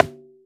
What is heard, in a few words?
Rim Shot